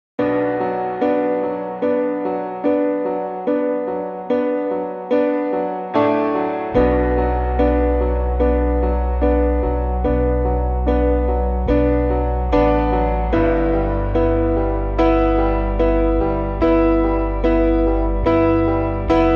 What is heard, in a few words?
Sad Sorrowful Instrumental Piano Backing Track